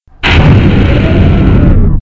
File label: Slidedoor; Playable Tutorial Level